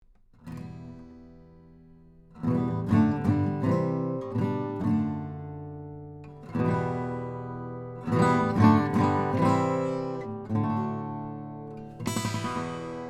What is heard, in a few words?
Chords; MK8s LDM